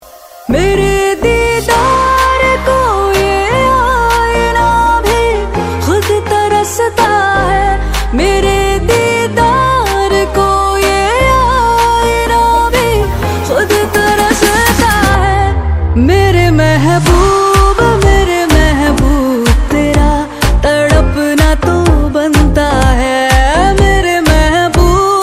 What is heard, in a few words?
female hindi ringtone